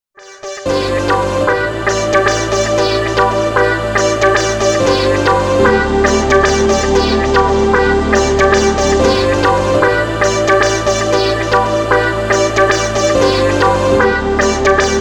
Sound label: Sequential; Soundzabound